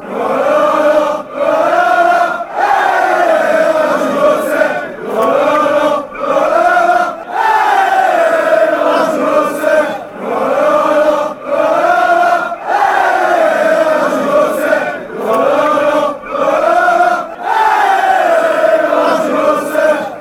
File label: hey fan chants; aj auxerre